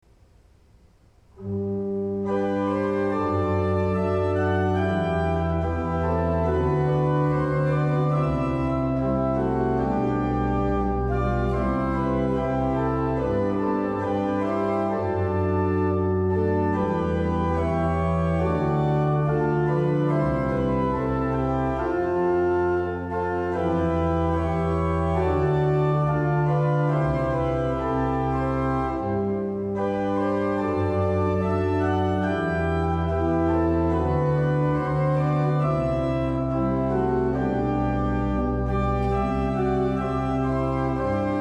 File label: Organ Jesus Climbs The Mountain